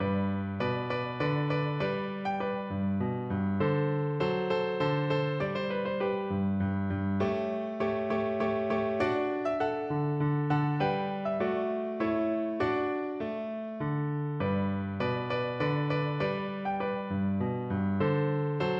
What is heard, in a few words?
Minuet from Don Giovanni, piano